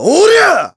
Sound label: Big Attack; Ghirahim; Skyward Sword